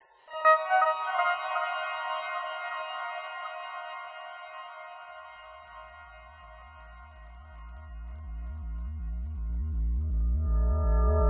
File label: Insects